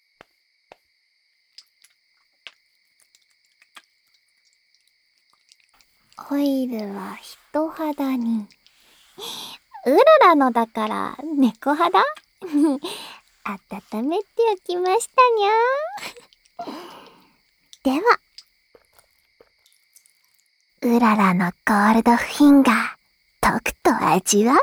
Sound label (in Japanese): 耳マッサージ, ASMR; お耳のコリコリの軟骨ぅ; 僕だけのVtuber特別版